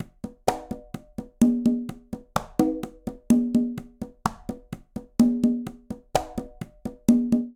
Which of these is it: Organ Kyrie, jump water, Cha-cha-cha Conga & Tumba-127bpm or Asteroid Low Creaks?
Cha-cha-cha Conga & Tumba-127bpm